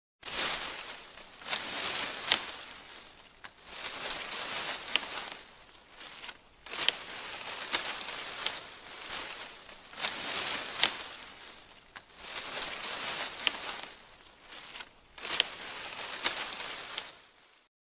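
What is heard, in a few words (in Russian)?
bush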